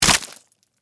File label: Flesh Chop; Blood and Guts (Sound Effects:Horror); BlastWave